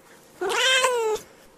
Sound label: kitty dying; minecraft; technic